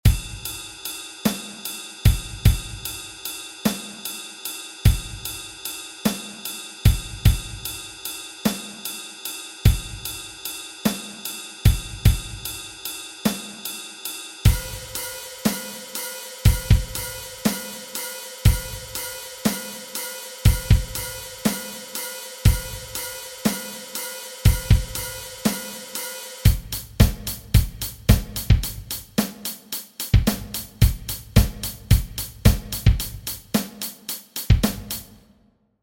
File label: blues hardrock funk